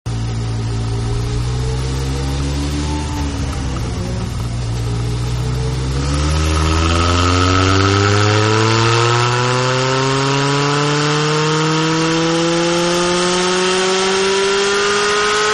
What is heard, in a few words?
Ducati Goes Hard, Motorcycles; Vehicles; Partners In Rhyme